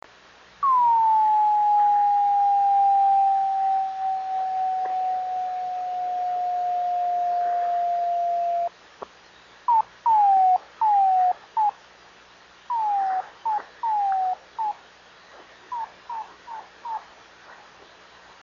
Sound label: 26770KHz